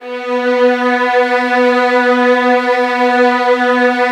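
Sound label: violin; Virtuoso Strings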